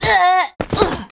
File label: Generic F dead